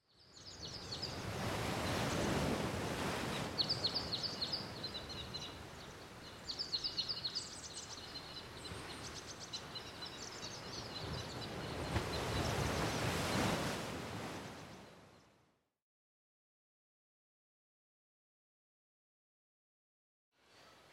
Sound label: Ambient; Age of Empires III; cinematics